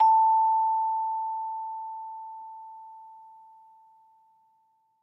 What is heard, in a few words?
Musical Bellflowers